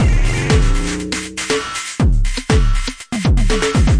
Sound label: 120 growly thudder bass, drum loops and breaks